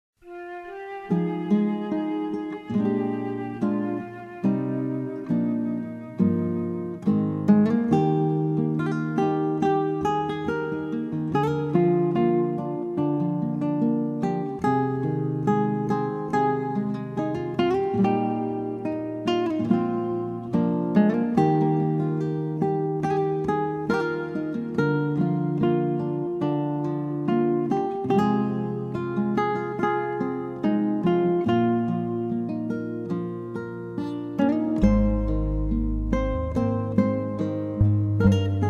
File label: Acoustic Praise Instrumental